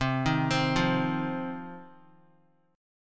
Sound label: C Minor Double Flat 5th, C, Guitar; arpeggiated; chordshape; Cmbb5 arpeggiated